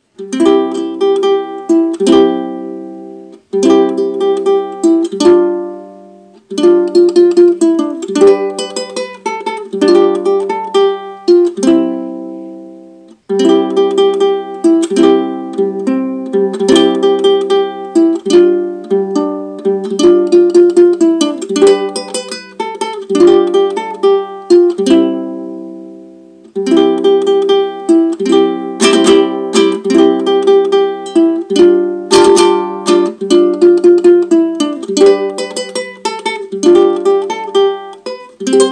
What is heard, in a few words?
Bandurka Uke; Perelaz perelaz; BugsGear Travel BL-BK